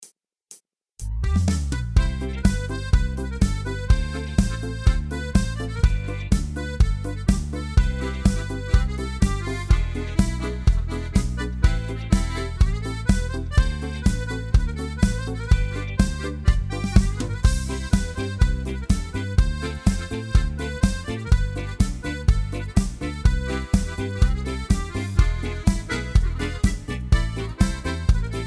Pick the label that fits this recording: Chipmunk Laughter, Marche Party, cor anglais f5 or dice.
Marche Party